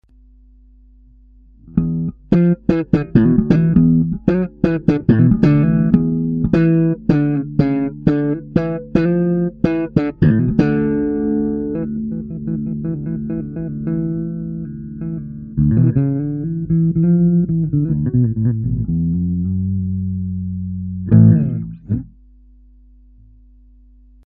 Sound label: b slap disto; guitare